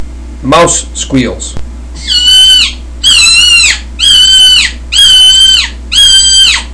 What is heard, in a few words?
super screamer, turkey hunting